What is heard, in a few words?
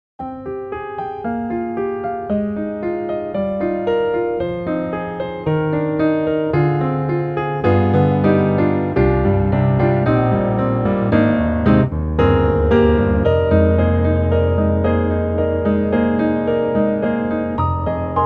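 Custom C7 Classical, piano; Spectrasonics; Keyscape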